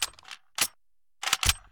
hunting rifle reload; Original TK Sounds

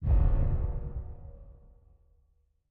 auction cancel; Original TK Sounds

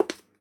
ceramic step; Golden Crayon Sounds

